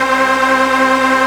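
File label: SYNT -L, synth, Drum & Bass, 160 BPM; Ueberschall; Drum & Bass Resonance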